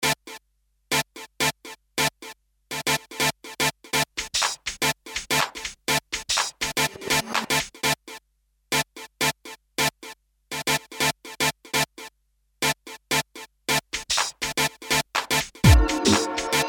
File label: Jingle; On Tv